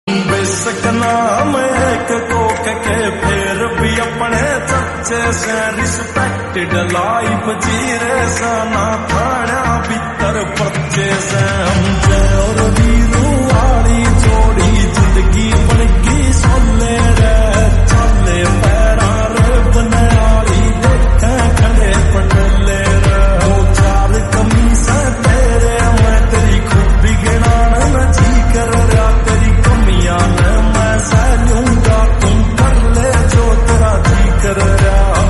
slow version haryanvi song

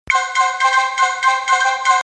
news flash

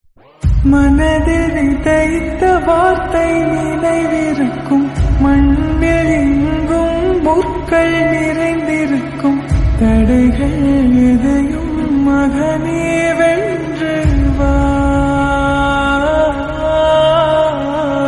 climax bgm; kgf 2

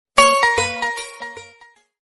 payal sms notification

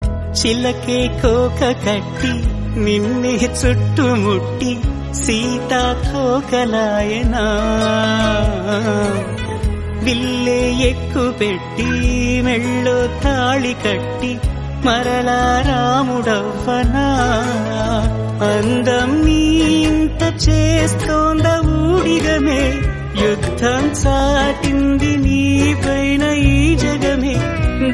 song telugu; sita ramam

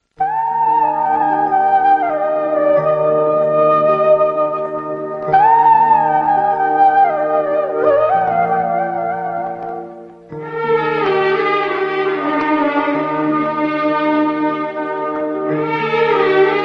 violin bgm

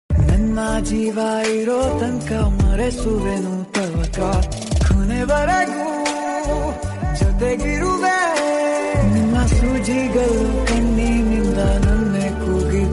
kannada song

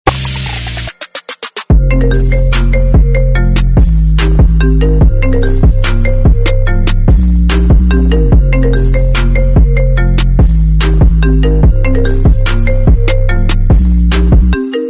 iphone 6 ringtone remix

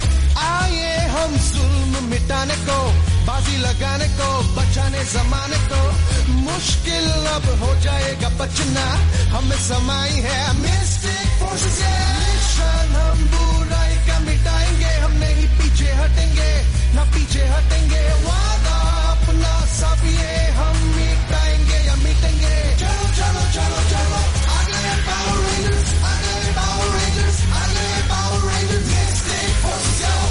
theme song; power rangers mystic force

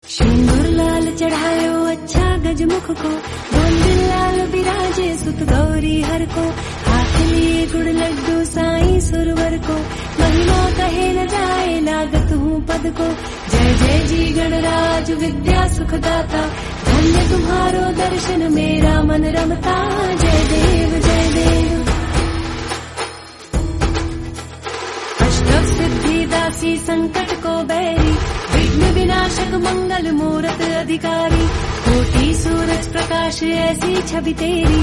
female devotional

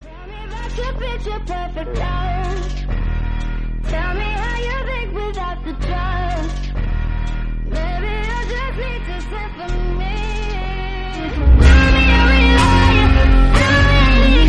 lofi

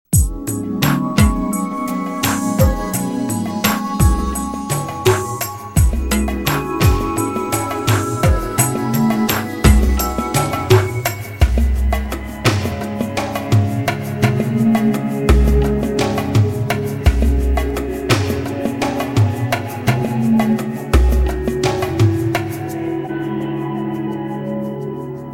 jazz silk

